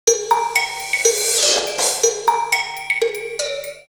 R8 122, drumloops, DrumMachines; X-Static Goldmine; 90 s Sample CDs

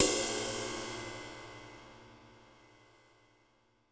New Brush Ride, Cymbals; Roland BK-5

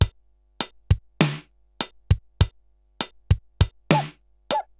RX17 (100BPM), cyborg beats; musicradar

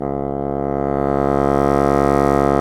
Bassoons

